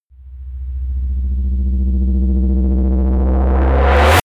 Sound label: reverse hit energized rumbling